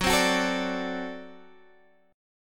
D 7th Gb, guitar

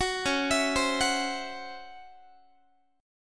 C sharp Minor Major 7th Double Flat 5th Guitar; C#mM7bb5; arpeggiated; 21,21,19,22,22,x

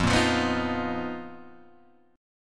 B Major 7th Flat 5th F, chord shape

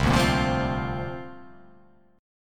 C 7th Suspended 2nd Suspended 4th C down

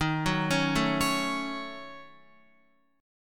Eb Minor 7th Flat 5th Eb down Guitar; Ebm7b5; arpeggiated; JGuitar

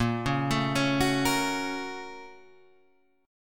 A sharp Minor Major 7th Sharp 5th; A#mM7#5; {6 4 7 6 7 6} arpeggiated

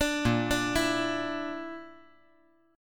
A sharp Major Flat 5th; arpeggiated

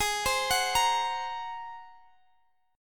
Bb 7th Suspended 2nd Sharp 5th Ab

